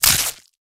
snatch; Battle

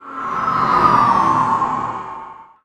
breech; Battle

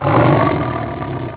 dominate; Battle

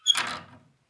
vice; Tradeskills

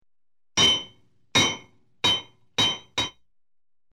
smith hammer; Tradeskills

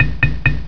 tink tink tink; Tradeskills

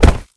tail swipe; Battle

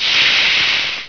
sizzling; Battle

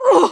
death cry; Battle

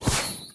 invis; Battle

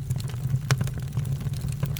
forge fire; Battle

